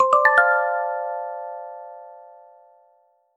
friend request

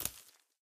harvest plant

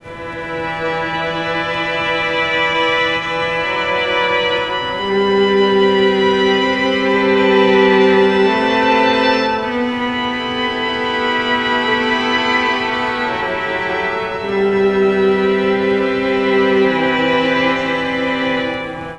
loop strings

